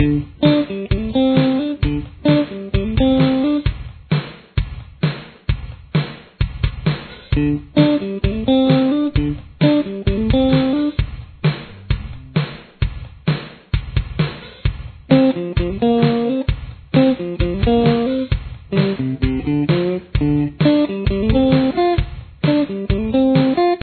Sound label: guitar